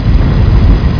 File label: flamethrower shoot